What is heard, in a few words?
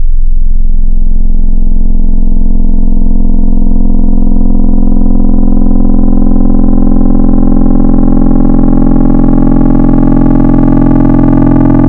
Dirty Sine, Wavetables; Cymatics; Future House Wavetables; Serum